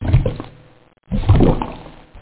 lava bub

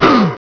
GOT HIT

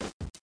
Disco - Menu Popup; discotheme

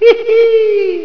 highscore; Brickles Deluxe v1.1

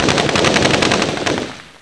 BULLET; Flying Nightmares Demo; Flight Sims

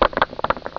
Block Destruction; BOOM

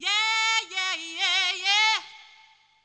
yeahs, Vocal Samples

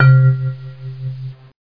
VIBES; Alone in the Dark Demo

